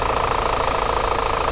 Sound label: ford focus, Vehicles